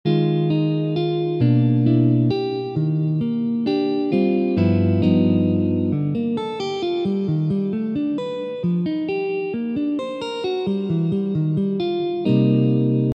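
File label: etude with chords, jazz guitar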